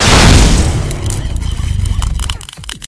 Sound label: frost launcher